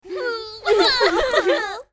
Blues Mourn

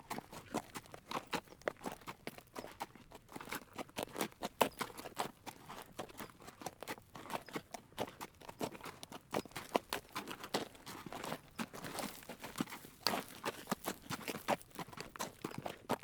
horse reverse walk

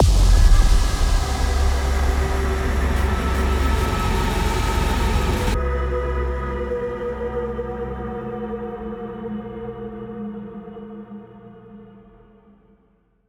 defeat 122bpm

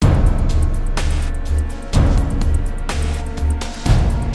Medium Music; Alien Breed Trilogy; PS3; Xbox 360

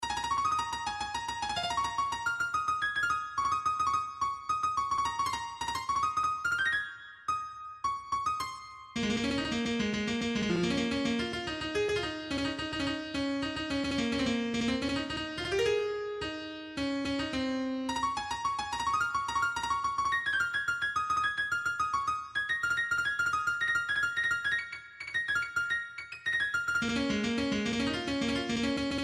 What [MIDI]; friday night funkin vs. umbal family computer

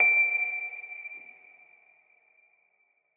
Fruit Note D6; Forest SFX

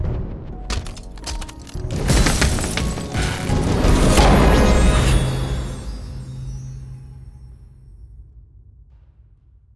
Succes victory screen

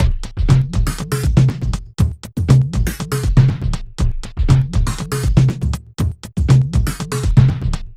Ethnova

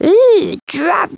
Sound drum

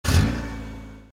Brr; Troll Face Quest Unlucky; Android

